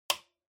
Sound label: Calculator (Switch Power)